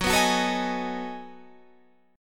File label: B 13th Gb, chord